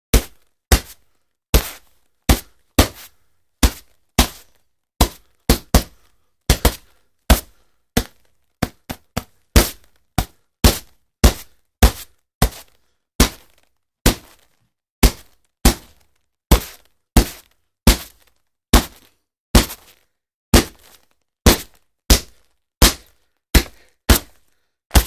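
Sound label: Boxing Gloves Hitting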